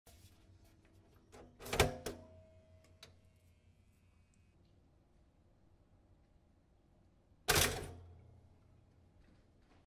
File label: toaster